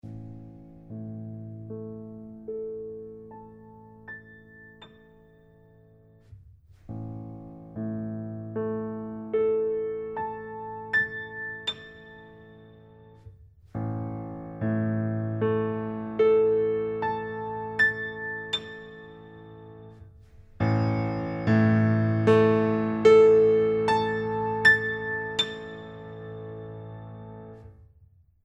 A leise bis laut mit Pedal

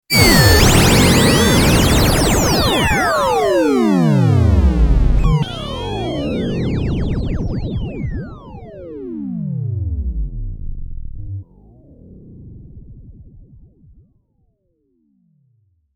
MKS-80 Fat FM Effekt

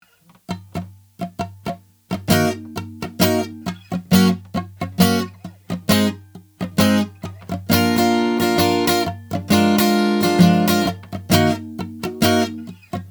Offbeat Gitarre dbx 376

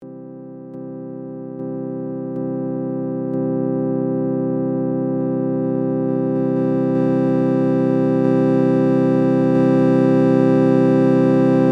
Vox Zugriegel nacheinander aufziehen

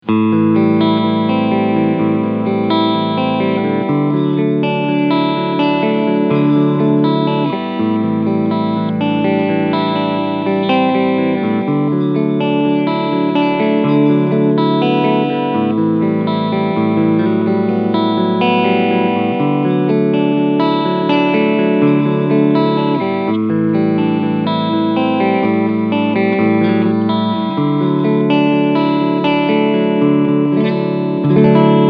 Cort G 290 LE Cleansound Schalter Position 3

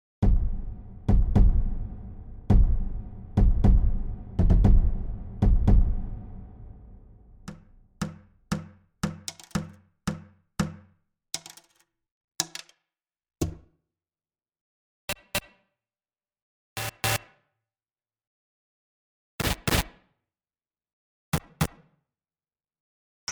Perkussive

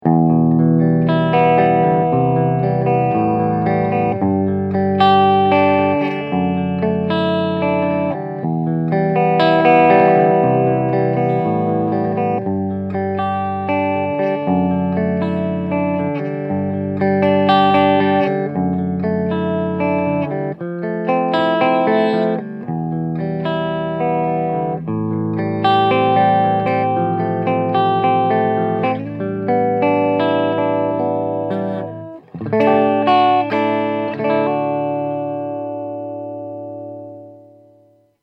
Clean Mikrofon

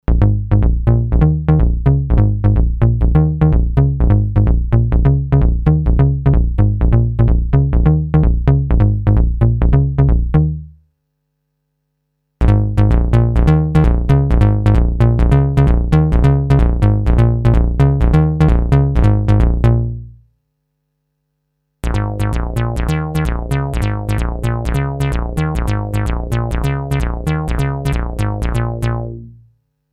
basslauf

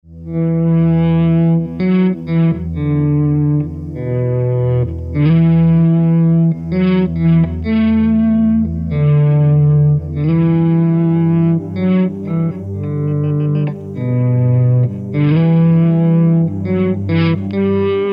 Variation E Moll Scale

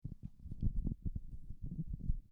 Hand Sennheiser e835s

